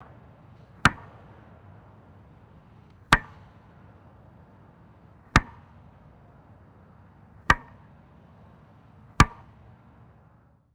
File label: wooden bat tapping rubber home plate; sports